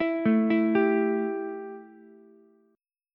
E Minor Double Flat 5th, Ukulele; arpeggiated; JGuitar

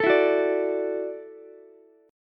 Gb Minor 7th Sharp 5th, Ukulele; strummed; JGuitar

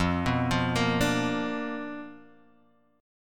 B Minor Major 7th Flat 5th, chord

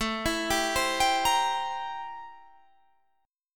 A-Minor 7th Flat 9th, chord

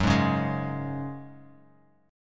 Gb Suspended 2nd, Bass Standard; strummed; 14,11,11,13

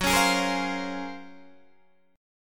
Gb Major Sharp 11th, Guitar DADGAD; GbM#11 strummed

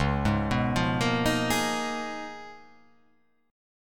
Db 13th Db down, Db13 chord; arpeggiated; JGuitar

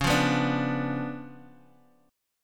Db 7th Flat 9th Db, down, Guitar Half Step Down; strummed; JGuitar

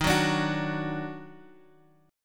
Eb Minor Major 7th, down, Guitar, Half Step Down; chord

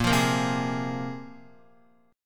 Bb 7th Suspended 2nd Bb, Guitar 4E 4A 5D 5G 5C 6F; Bb7sus2; strummed; JGuitar

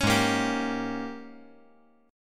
Ab Major 7th Suspended 2nd Suspended 4th Ab, down; strummed; JGuitar

